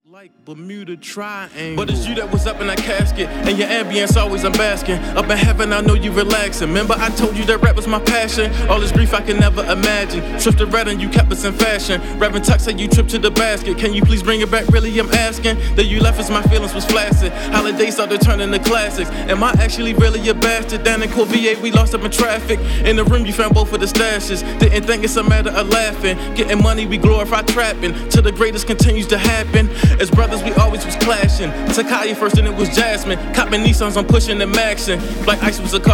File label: Hip hop